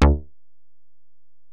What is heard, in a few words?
Bell, Alesis DM-PRO